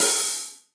Hihat Open Direct Natural; Drum Hits; Ableton; Factory Packs; Session Drums Studio; MPE Hits

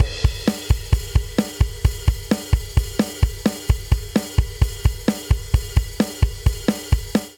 Driving Rock Breakdown 130 bpm, drum; 4 4; MIDI Clips; Ableton; Factory Packs; Drum Booth

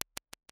Flutter Echo; Convolution; NI Kontakt 5

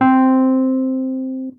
Wurli DI 3 OCTAVES, electric pianos; Electric Keyboards; Ableton; Factory Packs

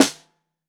Snare Bottom

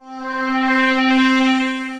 Nostalgia Pad, Waveframe Pad; Ableton; Retro Synths; Professional Nostalgia Pad

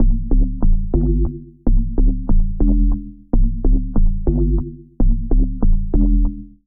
Bass A Minor 144 bpm; MIDI Clips; Ableton; Skitter and Step; Power On

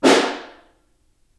Snare Far

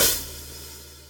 Hat Open Half, hihat; drum hits; Ableton; Factory Packs; Drumdrops Recording Hybrid Kit

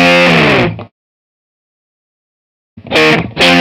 133 Pop Guitar Dist F; Pop Rock; Electric

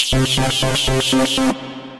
One Note Wander, Synth Rhythmic; Ableton; Glitch and Wash

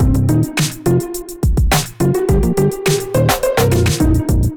Three Way Kit (Cmin) 105bpm Beat; Ableton; Factory Packs; Samplification; Hop Three Way Kit

